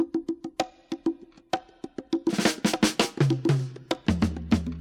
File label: Drum loops, Rolls